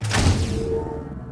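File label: lifter Claw start; machines mechanical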